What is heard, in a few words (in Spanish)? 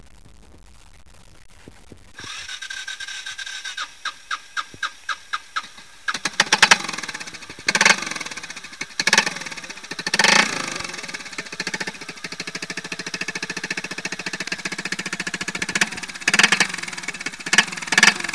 sonido moto